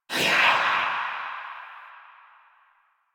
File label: Choir Dash; Warframe